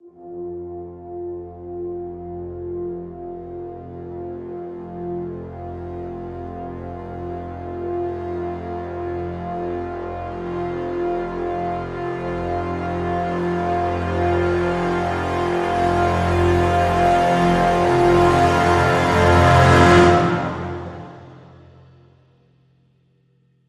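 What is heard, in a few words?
Crescendo Brass Swell Full (F)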